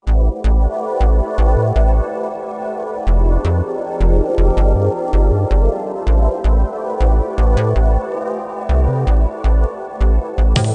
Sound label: Underwater; Cosmos Chaos; NDS